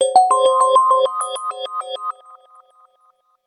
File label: ringtones; HTC; One-M7